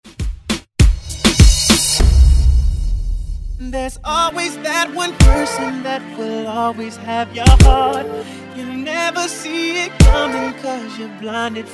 Moombah Redrum

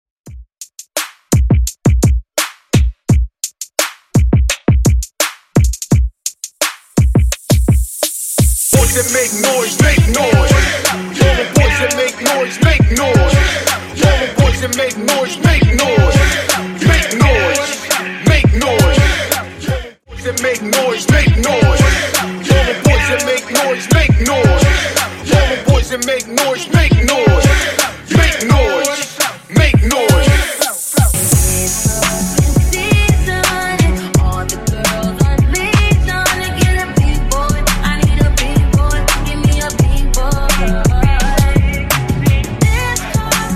Hype Re Drum 85bpm

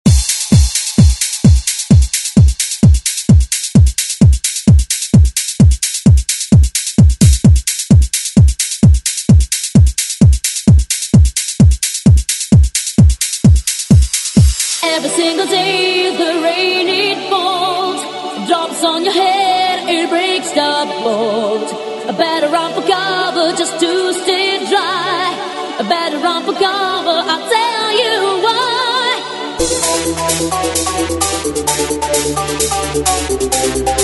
90s ReDrum

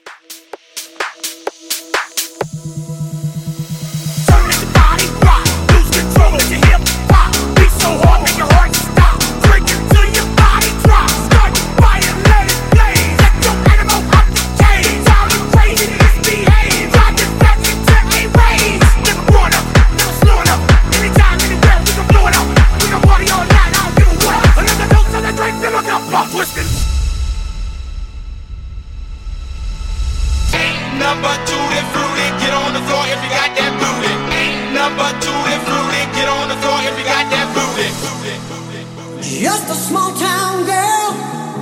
Hype, 128

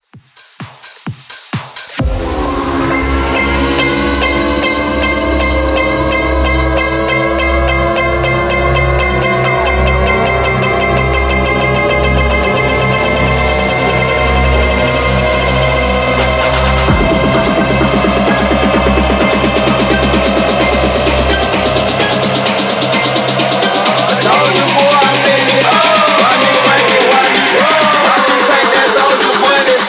Club House 129 BPM

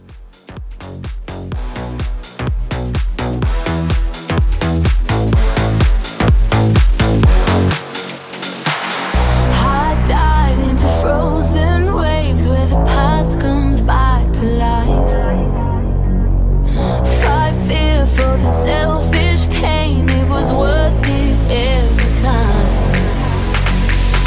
Future 126

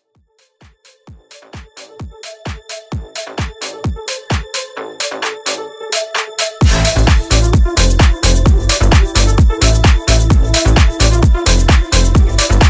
House Vocal Edit

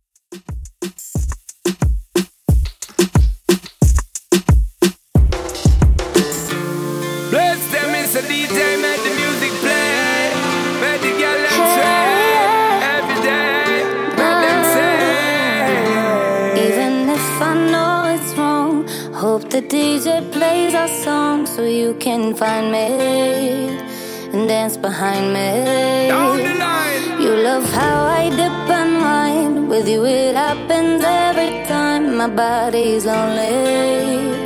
Reggaeton 90Bpm

